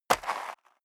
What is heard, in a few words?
step leather shoes gravel light single right; schritte